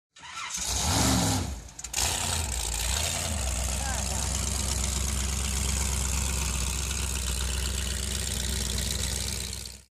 boat

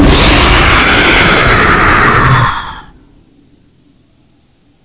jumpscare sound; one night at springtrap 1

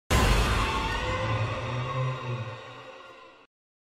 loud and sudden jumpscare

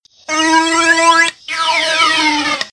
funny tube short

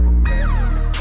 synth effect

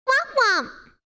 womp womp sound alert; filian

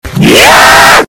zombie scream loud; cod

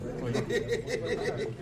eheheheh, rire

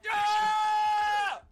just scream; sneakers screamers

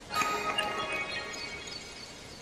shrine open

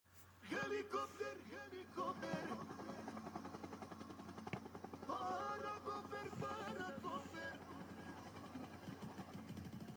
helicopter; aracopter

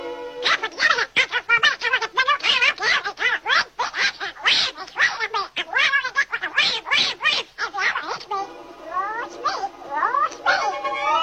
annoying duckling

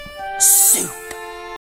reverb

